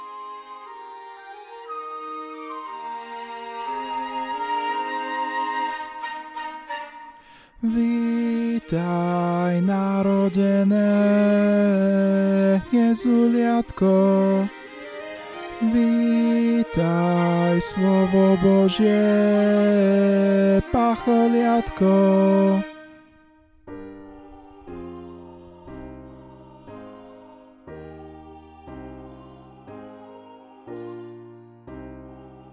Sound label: Tenor